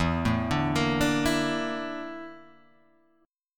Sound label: Bb Major 7th Flat 5th